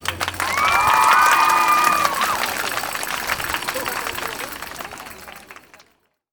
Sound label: claps